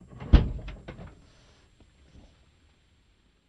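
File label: Cutlass Interior Door Open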